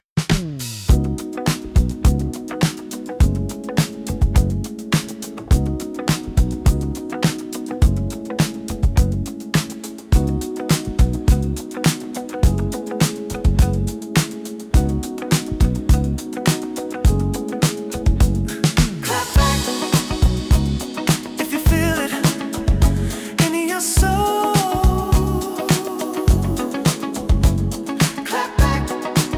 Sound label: Disco